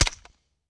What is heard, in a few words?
w smp5; The Sum of All Fears